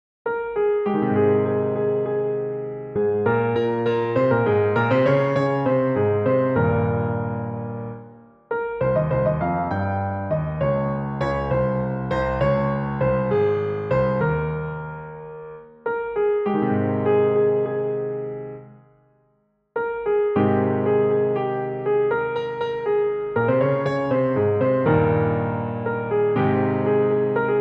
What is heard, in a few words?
solo, piano; Manto